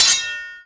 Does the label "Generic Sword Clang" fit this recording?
Yes